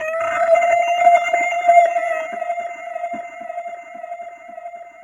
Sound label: F, Guitars, Drum & Bass; Total Drum&Bass; 90 s Sample CDs